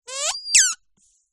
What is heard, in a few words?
leisure toy squeaky squeeze hard